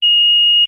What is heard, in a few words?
Beeps Single Tones Sh